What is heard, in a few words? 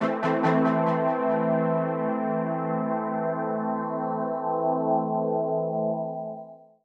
techno pad 140; Techno2; Keys